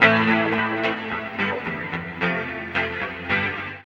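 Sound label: guitar, left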